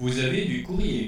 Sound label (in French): vous avez du courrier chorus